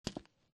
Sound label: grunt step; Titanfall 2